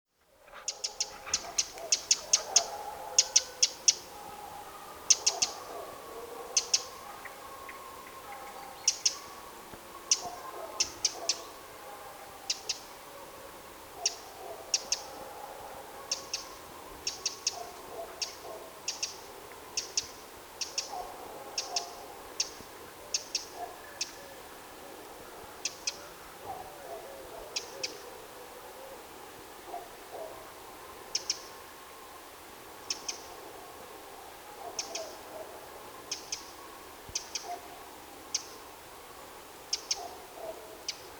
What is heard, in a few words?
Troglodytes troglodytes; SETE